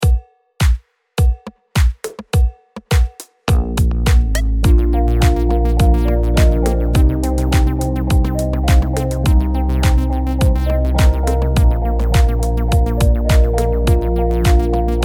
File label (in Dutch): Solo